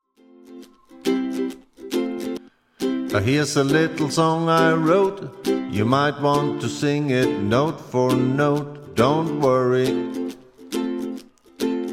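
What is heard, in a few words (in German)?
ukulele gesang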